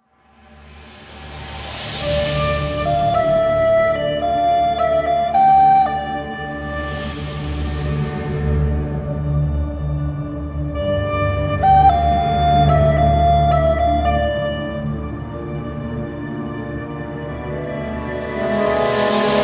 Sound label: battle intro